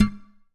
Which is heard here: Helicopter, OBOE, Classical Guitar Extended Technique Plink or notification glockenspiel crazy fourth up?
Classical Guitar Extended Technique Plink